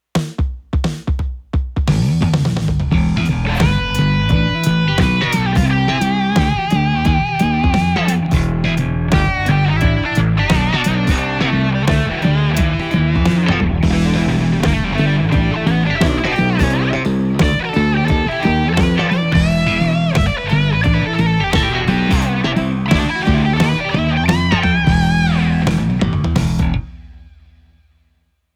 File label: Slap Guitars; Reverend Guitars THUNDERGUN MIDNIGHT BLACK